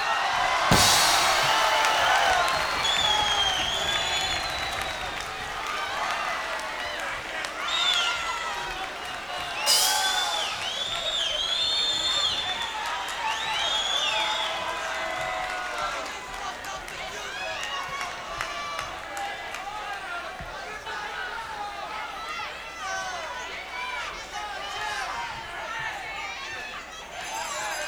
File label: Cheering Tuning, Live